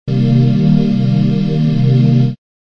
fluffy pad; Pads

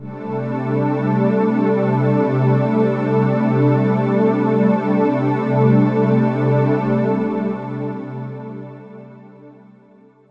nineties phase pad; Pads

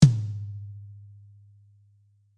Tom, DrumKit, REAL LIVE KITS; Osama Qureshi DrumKit

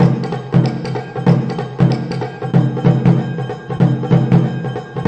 japanophile, drum loops and breaks, 95 bpm; 091 to 100 bpm

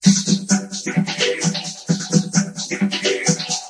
130 bpm vocoded, drum loop

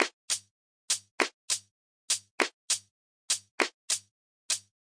100 skank snare and hat, drum loops and breaks; 091 to 100 bpm

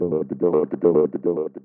144 filter stutter; VOCAL OR VOXY LOOPS

